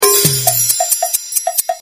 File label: salsa